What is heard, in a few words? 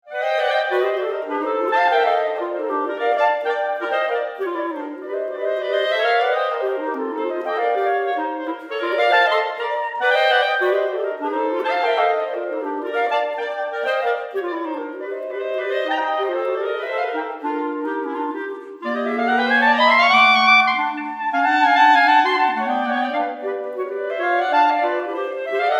Swingin Clarinets